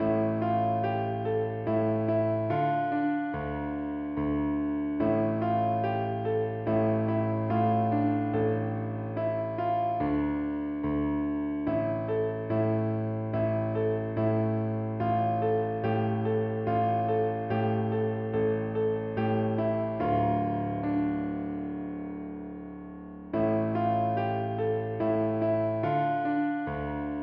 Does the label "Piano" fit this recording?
Yes